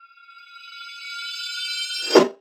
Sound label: pop